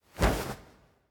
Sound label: phoenix wing soft; smite; npc